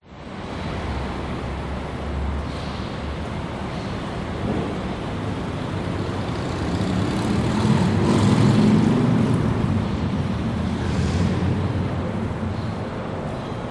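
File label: cathedral internal ambience traffic outside people, sydney; public places; cathedral st marys sydney cbd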